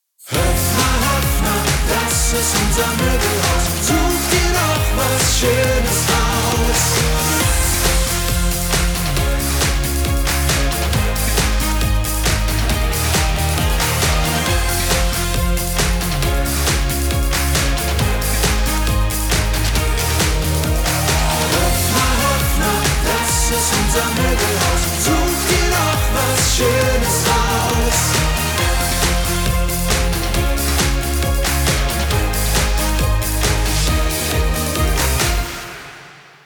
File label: song; hoeffner living